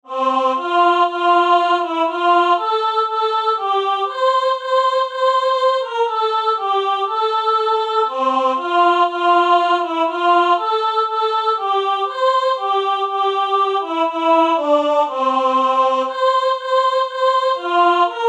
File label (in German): Hark The Herald Angels Sing Sopran, Midi; germersheim; Weihnachten